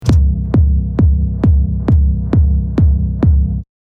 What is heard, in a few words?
drop kick